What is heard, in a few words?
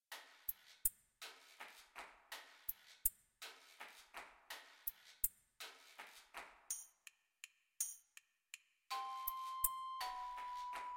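Wollongong, recorder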